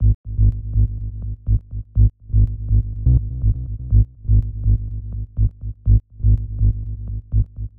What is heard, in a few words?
tech house bass G#m 123; loops; tech house bass samples